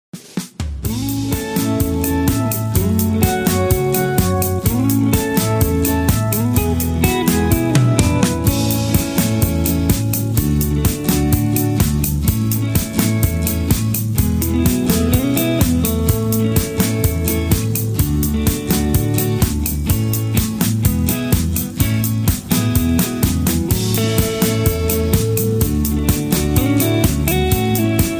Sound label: Instrumental with No Leads